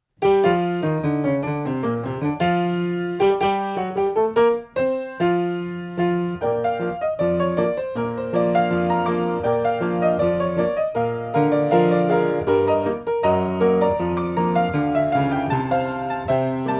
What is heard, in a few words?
ragtime pineapple rag